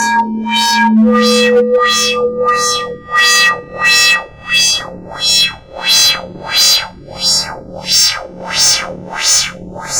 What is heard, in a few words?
RINGTONE